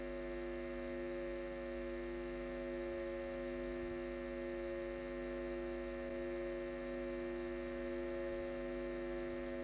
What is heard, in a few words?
Elect Hum